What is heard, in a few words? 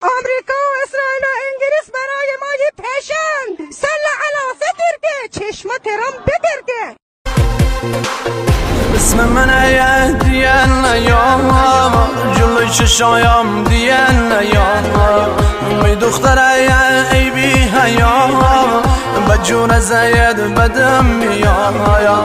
seterke, lorestani